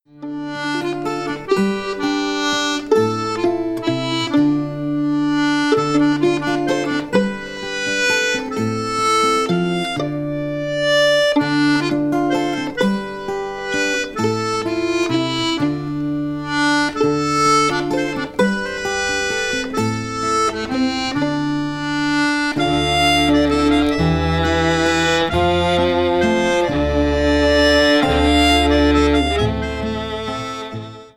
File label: Harmonica van der Aa Compact III G Cis; Staalkaart